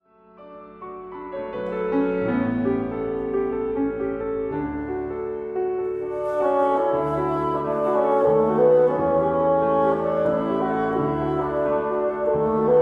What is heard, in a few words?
Bassoon Piano; Emmanuel Chabrier; L'ile heureuse